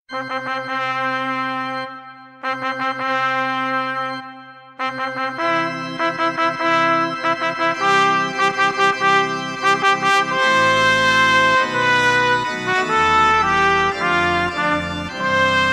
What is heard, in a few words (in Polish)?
marsz weselny mendelssohna 1 trabka